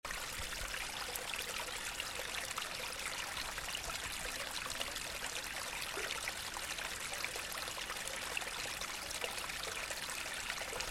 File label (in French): AMB EAU FONTAINE